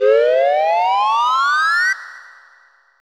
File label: Zoom In; Banjo Kazooie